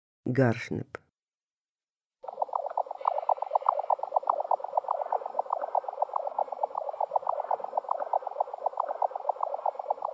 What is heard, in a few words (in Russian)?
garshnep golos